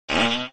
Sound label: fartin